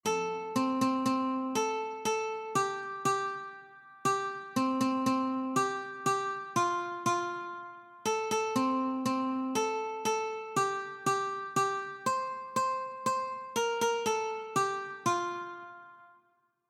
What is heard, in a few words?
Five little Ducks Ukulele